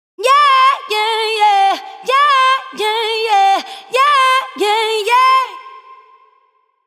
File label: 130 vocal riff yeah refrains hi Am